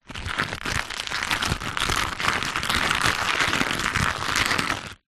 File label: foley latex rubber mask movements handling